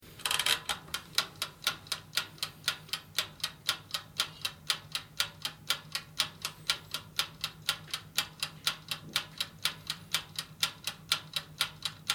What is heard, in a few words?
Timed Countdown: Mechanical clock or watch counting down the seconds. Tick tock; Clock Sound Effects; LynneMusic